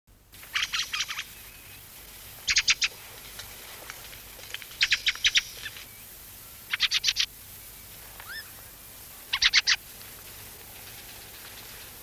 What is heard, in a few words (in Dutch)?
krammes, trekroepjes